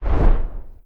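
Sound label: candle extinguish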